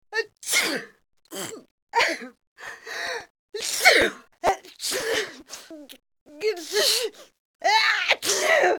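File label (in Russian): chihane soplivoe prodolzhitelnoe, long snotty sneezing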